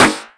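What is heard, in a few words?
127 drum 2 R, Fresh Disco House; USB Soundscan vol.20; AKAI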